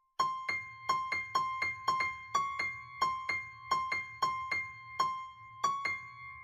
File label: piano; gorilla tag; Virtual Piano